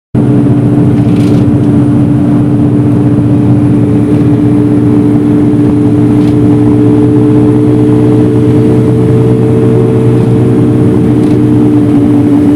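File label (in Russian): звук маршрутки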